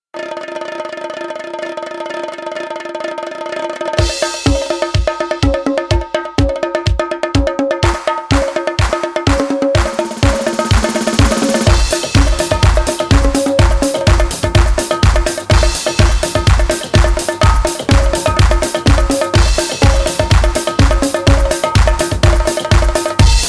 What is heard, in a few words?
Vals Samba